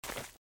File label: grass; alternativaplatform; alternativa3d v5; temple